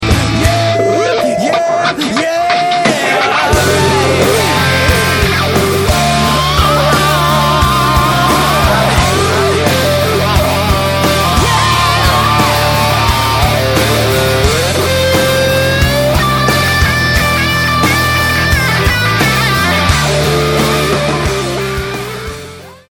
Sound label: solo